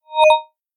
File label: notification; samsung Aleart